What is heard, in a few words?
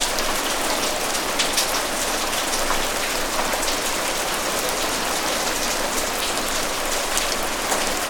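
weather rain medium drips street; sound effects one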